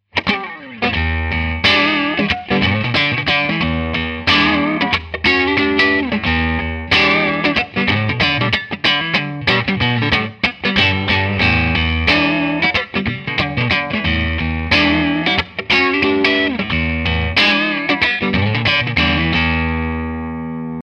Clean; Koch Amps Little Gristle

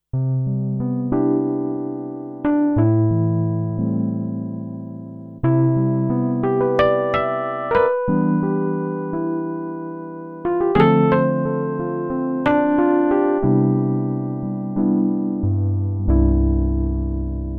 Korg i3 E Piano

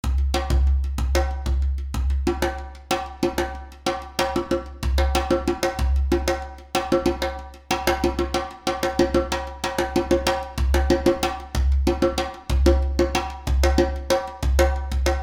West Africa Djembe Solo

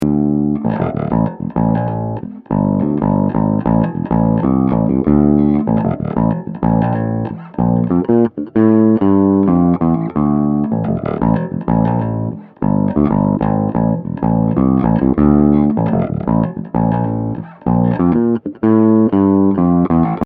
Bass Tight Drive Finger; Amptweaker

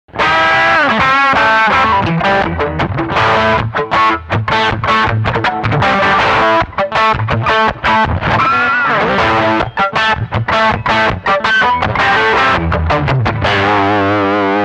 Heavy Riff

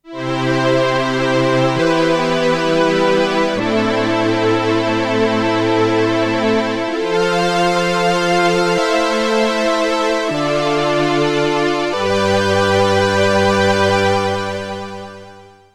Polysix Poly Strings

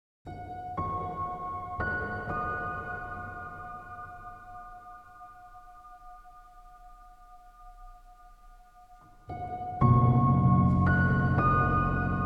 Ambient mix

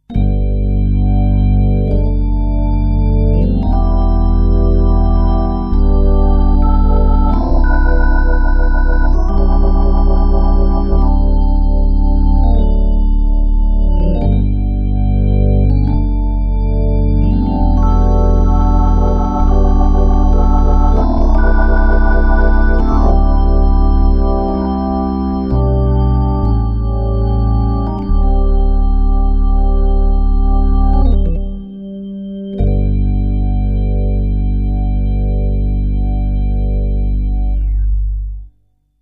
Crumar Mojo Classic Gospel; Time; Max Tempia

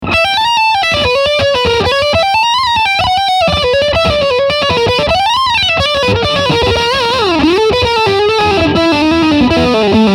Lead Channel High Gain

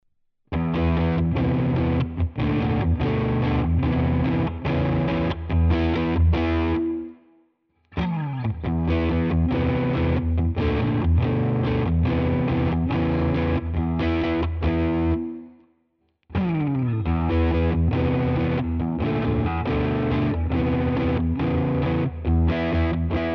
clean riff

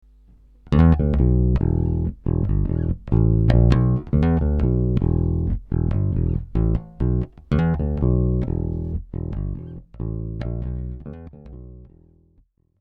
direct

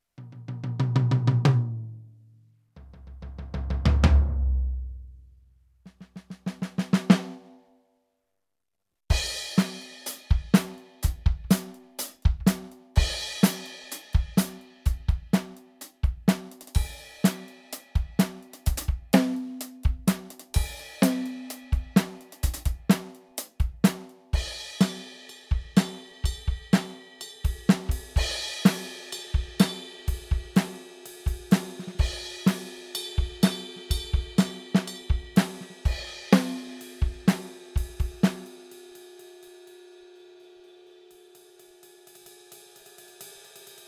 EFNOTE 7 Kit Vintage Coated

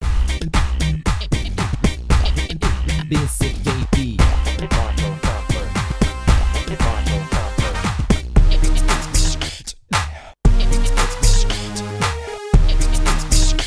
Pattern Medley

